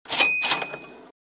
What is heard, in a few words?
kaching